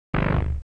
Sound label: Low Bass stab, soundkits